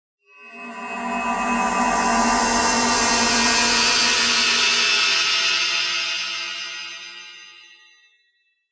sound design effect mysterious wave; sound effects one

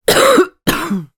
human cough female 60 years old; zapsplat

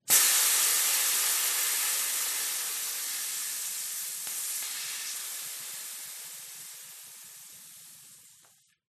Letting Air Out Of Tire

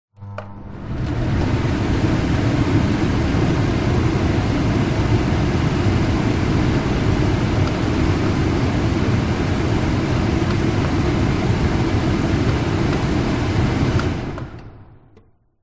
household oven overhead extractor fan on run off

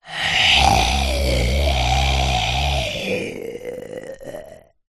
horror zombie groan child

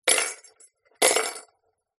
bells sleigh bells x2 down on table

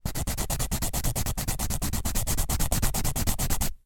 human filing nails

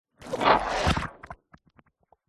science fiction movement slimy weird; zapsplat

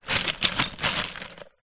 toys lego pieces box pile movement

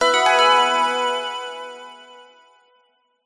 multimedia alert warm bright positive event; zapsplat

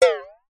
cartoon metal impact

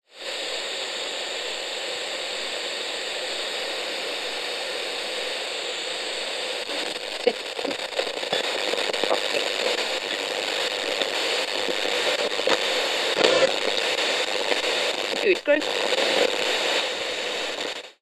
technology radio static interference then tuning

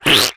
cartoon squish squelch wet; zapsplat

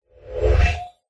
science fiction door open slide; zapsplat

